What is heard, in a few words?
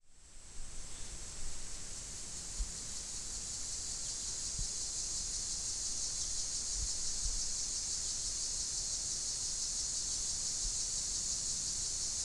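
Linnes Cicada, the high-pitched buzz of one or more Dog-day Cicadas; filling the gaps at 00:33, 01:02, and 01:17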